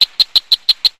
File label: Frog Cricket Chirps